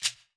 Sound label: Shaker Fat